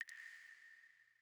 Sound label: water drip light; Rust